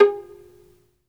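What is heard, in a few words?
solo violin, Synclavier Strings; ILIO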